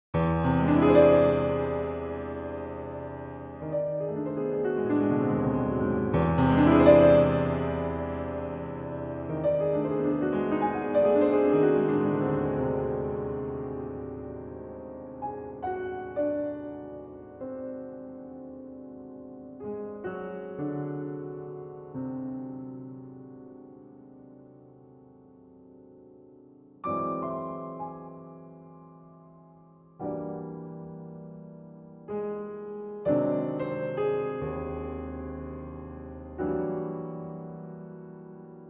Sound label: Solo Piano